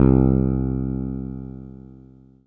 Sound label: FINGER C3; Greytsounds; Scott Peers Sound Engineering